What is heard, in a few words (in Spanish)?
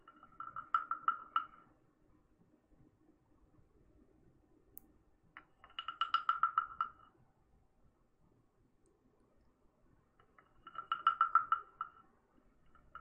ASMR Sonidos Tibetanos